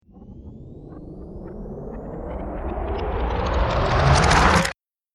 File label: Reverse Suction Black Hole Scifi Space Riser Spaceship Power Up; Sound Design; Spacecraft